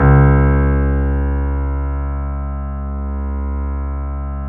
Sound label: BOSENDORF